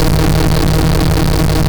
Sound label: plain loop; movement